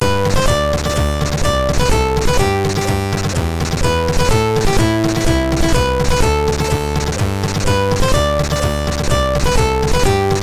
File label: sid MOS6581